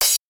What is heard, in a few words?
cym